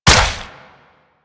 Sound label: step default; Warframe; Infested; Zealoid Prelate